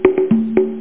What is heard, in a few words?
congas; The Cruncher